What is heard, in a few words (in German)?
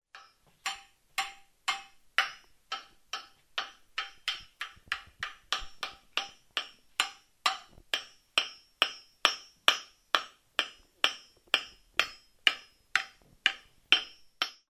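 hämmern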